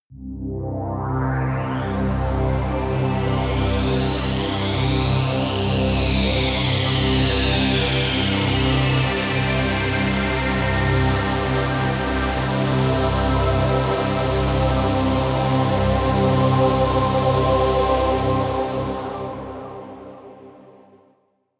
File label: pad sweep wind; flaechen